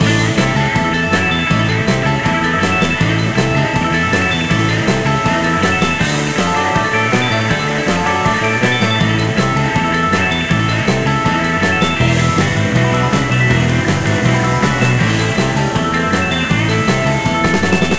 big Win Loop; netent; wildwater

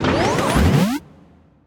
intro Screen Sound; netent; reelrush

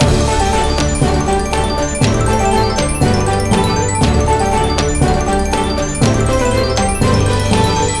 big Win Loop; netent; luckyangler

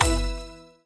sticky Win; netent; kingofslots

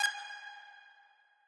countup End; netent

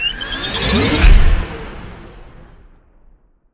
wild Win; netent; multipliermayhem

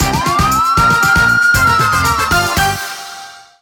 intro Free Spin; netent; reelsteal

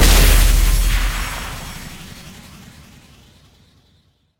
big Win Start; netent; bloodsuckers2

